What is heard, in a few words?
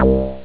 bass